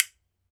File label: B.BONES, Ireland; E-MU Producer Series Vol. 7 – Old World Instruments